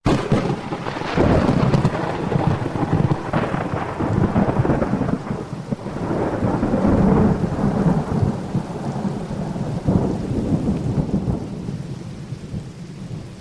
Thunder Strikes Lightning Storm Claps

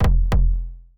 Drum

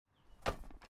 Basket Ball Going Into Hoop And Hitting The Net

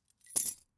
Keys Dropped

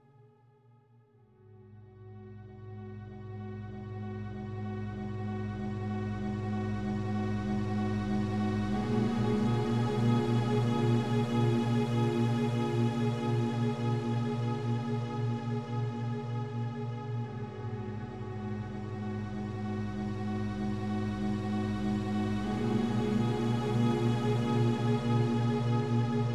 Strings

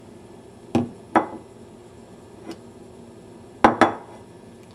Puts Bowl Down

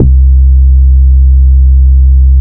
Sub Bass

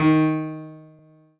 Piano Ff

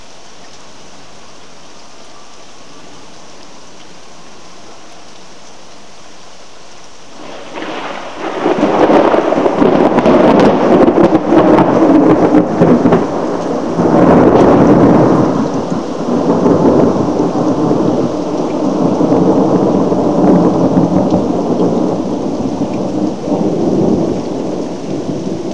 Thunder Roll

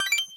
Success arpeggio

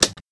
Click

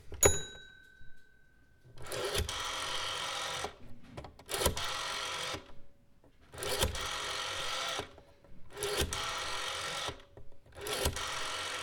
Analogue Phone Dial

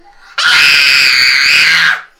Kid Scream; Audioversum Innsbruck

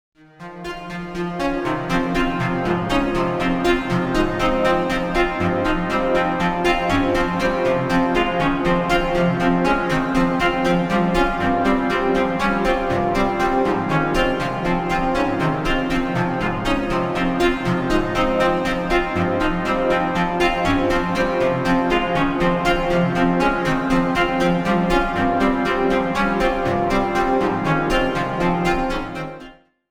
String Dischordish

